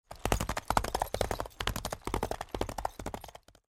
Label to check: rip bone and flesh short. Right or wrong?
Wrong